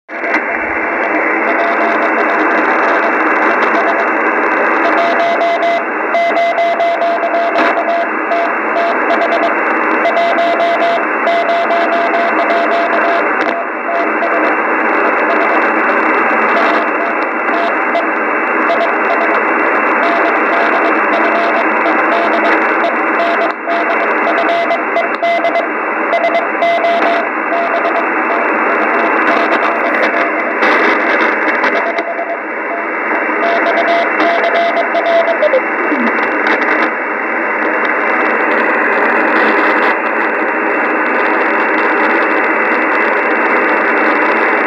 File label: Morse with noise